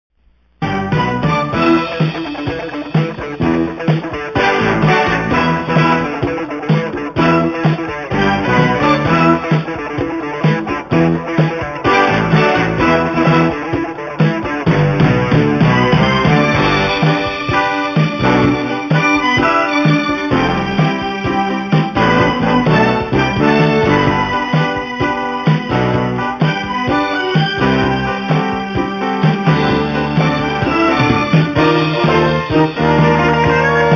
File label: ringtone; magnum pi